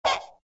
firehydrant popup; Toontown Online

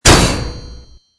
stomper small

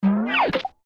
pairing jumping signal; Toontown Online

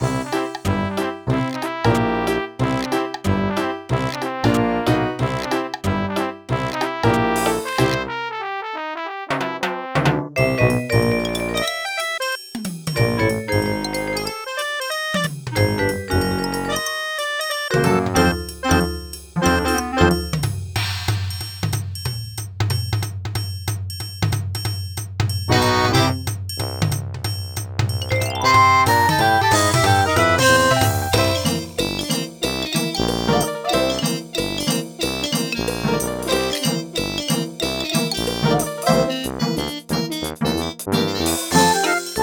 party swing dance

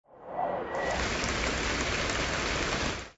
liquidate

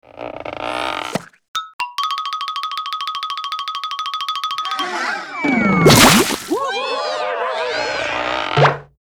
heal High Dive; Toontown Online

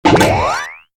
toon jury; Toontown Online

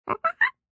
monkey question

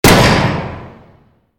camera shutter

